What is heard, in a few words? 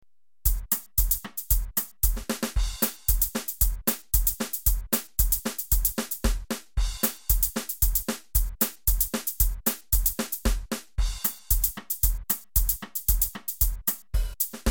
Drum tracks